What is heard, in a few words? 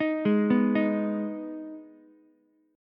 D sharp Suspended 4th Sharp 5th, Ukulele; arpeggiated; JGuitar